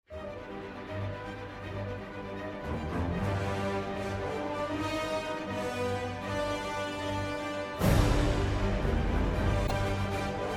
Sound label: logo animation and fanfare; Marvel Studios; 2013–2016